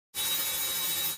Laser Saw Beam Short; laser fx

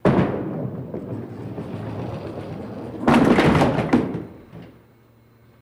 Bowling Ball Roll Pin

